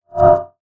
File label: GUI Swipe